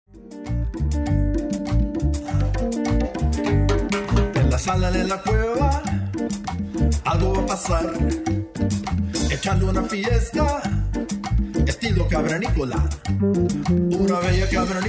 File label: Cumbia